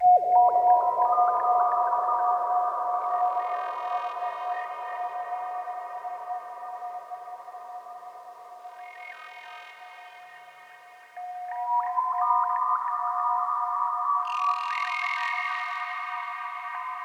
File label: Intro Ambient; soma gamerip 2015